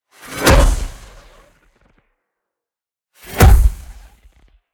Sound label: impacts; sonniss gdc